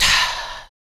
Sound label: MALE PERC, Vocal Planet, Jazz FX; Spectrasonics